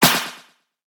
flower swim

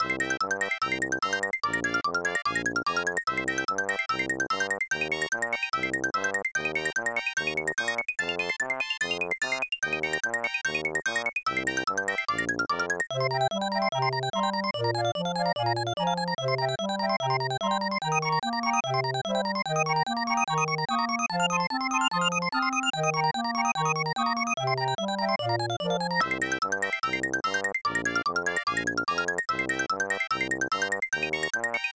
Mouse Maze Level Music; fun n games 1994 snes

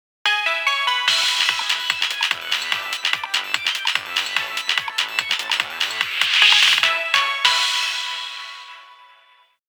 Radio Jingle (Noon); Animal Crossing New Horizons

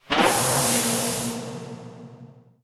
Attack Fire; portal quest; android; Cult Acolyte Blue

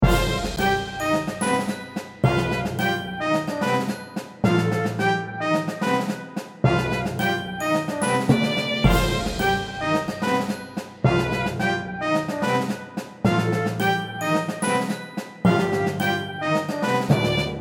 Palace Ruins (Orchestra); dragon fantasy book i the volumes of westeria

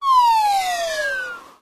Fall Slide Whistle; Doodle Jump 2

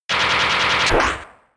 Spike Cannon

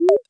Deliver

